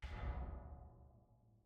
Crate Bump; City SFX

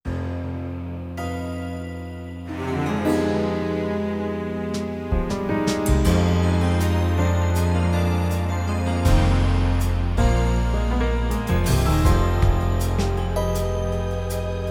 Sneaking for Clues; Crystalline

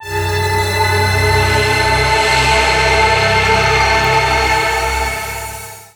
spooky short

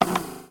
Servo Little Start

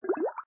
Start bubbles

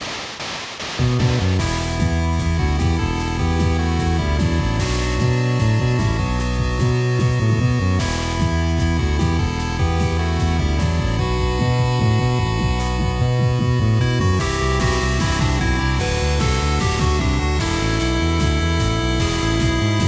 bonus bgm; petra

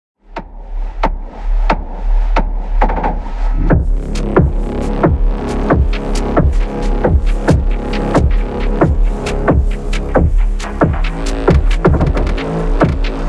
Scale Combat Loop 135bpm; Furi; Windows gamerip 2016

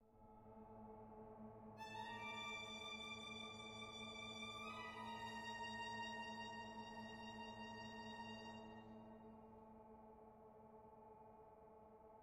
ambiant dark

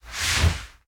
Whoosh Start

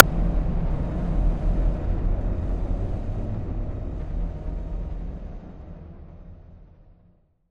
Ambience; Fear of Clowns

